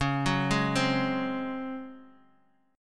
C sharp Major 7th Suspended 4th C sharp, Guitar Open D; x,4,4,2,3,x